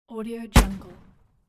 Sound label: gun shot